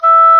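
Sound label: E5 soft straight, oboe; Northstar - New Gold VOL-2; EBONY OBOE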